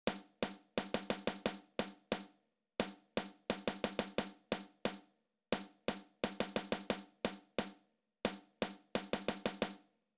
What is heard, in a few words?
For Snare Drums Only